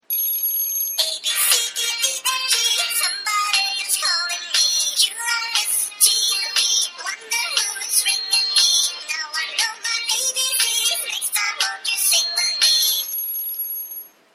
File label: abc song